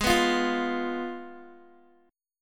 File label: Ab Minor 6th Ab, Guitar Open G; x,x,6,4,6,3; down